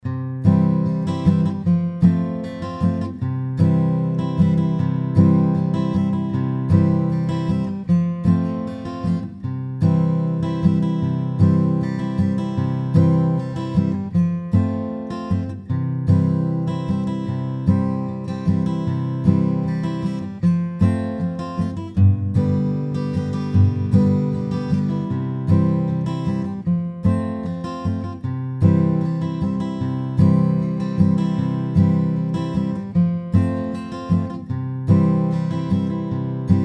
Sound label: strum style tunes